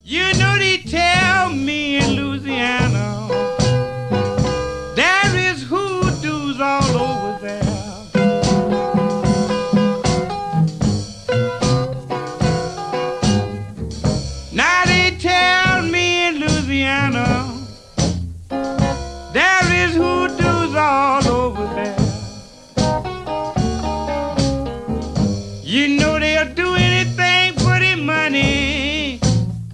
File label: Blues